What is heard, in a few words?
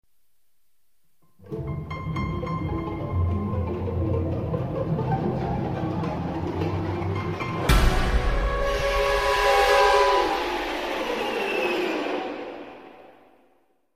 grunt marine attack; quake 4